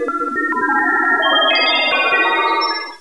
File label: churn